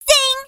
jump; freak fortress 2; hat kid

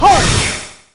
dash; rpg fortress; enemy; whiteflower

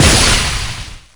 slash hit sound; chiabihamaru; cv project; invading executioner; zerosiki; slender

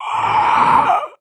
female zombie pain; nmrih; zerosiki; slender

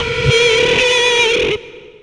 baby die; slender

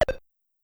shell hit; tf2ware ultimate; mariokart

